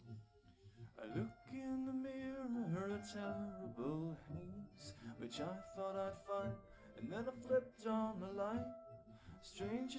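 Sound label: no drums, live recording 250ms 5cm; SiSEC11; underdetermined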